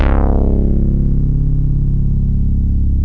MINIMOOG

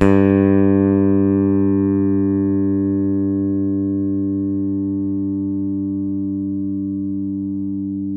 open G2, Bass; Masterbits CLIMAX 7 - Bass; KEN SMITH BS

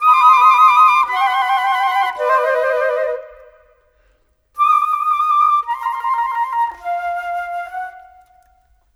Flutes, Cinematic; Sonic Foundry (Sony Creative Software); Orchestral Series VOL-3 - Cinematic

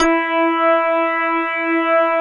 METAL BR

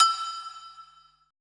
HI TUBE, PERC; BPC PERC

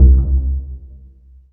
PIZZ, Dance Mega Synth

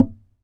SAZ TAP; Spectrasonics; Hans Zimmer Guitars VOL-2

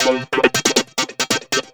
pure synth, techno, 138, c; Techno ID; instrument-loops

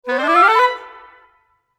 Woodwind run G7 (for C maj); PowerFX; Downtown Orchestra; Runs

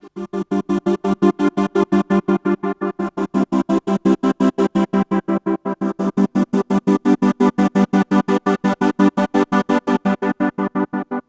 Gated Strings

